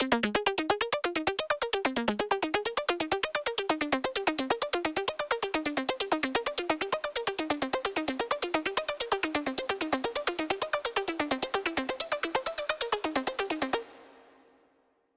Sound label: Arp (Bridge)